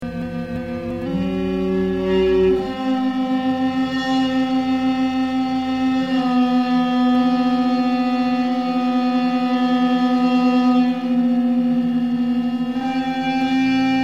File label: drone